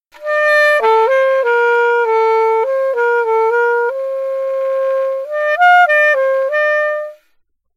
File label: altosax